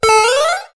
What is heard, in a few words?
Froggy Hop; SMB3